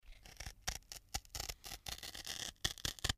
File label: MedicalScientific; Soundfx III